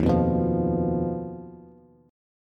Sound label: Eb Suspended 2nd Sharp 5th, Bass; Ebsus2#5 strummed; JGuitar